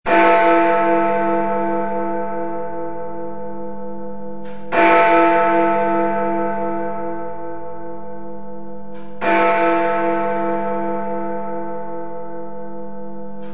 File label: Big Ben